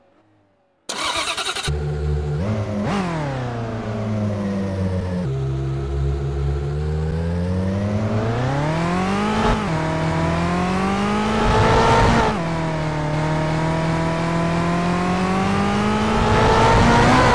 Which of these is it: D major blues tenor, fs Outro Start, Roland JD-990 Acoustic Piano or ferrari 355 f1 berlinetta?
ferrari 355 f1 berlinetta